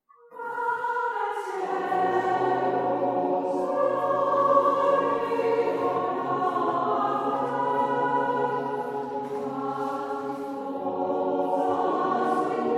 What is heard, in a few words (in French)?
CHOEUR DES ESCLAVES